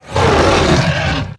roam, Dragon sounds; Unreal

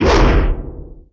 axe swing; statue

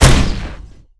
Rifle Shell

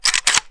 bow reload

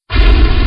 Fire Start

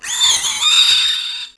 Die, Spinner

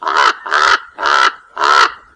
raven sound

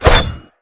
Shing

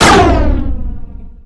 Medium Fire

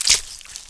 Fire, Spinner

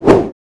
swing, blade

